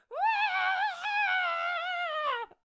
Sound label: Mace Spinner Attack Vocals